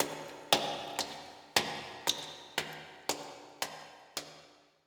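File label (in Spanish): Pasos bajando escaleras, footsteps going down stairs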